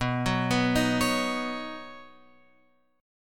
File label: B Major Add 9th, Guitar, Open F; arpeggiated; JGuitar